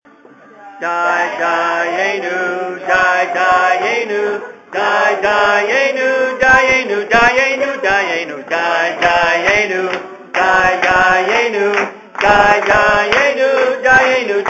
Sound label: passover singing